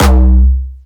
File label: C2, Jumpstyle Kick Solo; Vengeance Samples; Bassdrums; Jumpstyle Multis